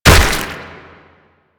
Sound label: Proto Infested Mutalist Big Land Flesh; Warframe; Abilities